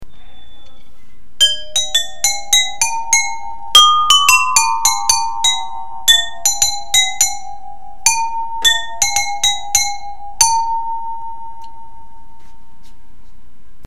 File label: copper; bobbi stewart